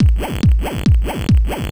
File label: TECHNO BEATS 140